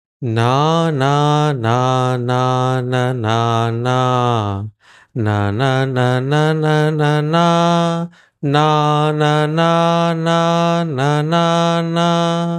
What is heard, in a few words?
Sragdhara tune